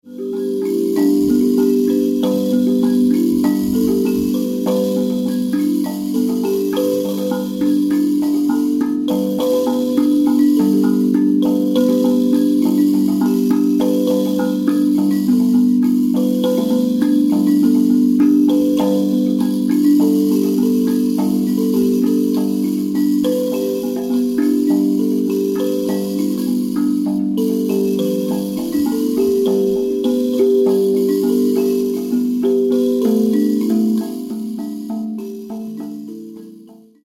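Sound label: kalimba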